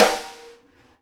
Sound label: Live Snares; E-MU Producer Series Vol. 8 – Platinum Phatt